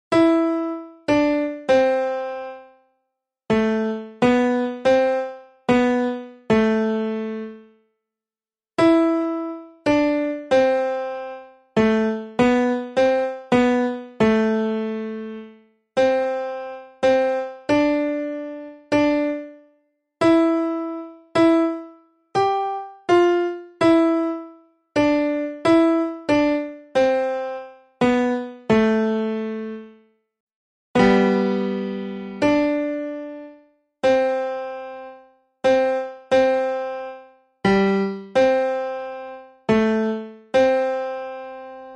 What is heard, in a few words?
piano